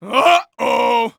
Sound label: vce uhoh fall